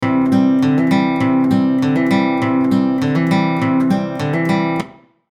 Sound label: AC Gtr Small Room